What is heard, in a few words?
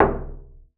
BASS FINGER; Xsample VOL-5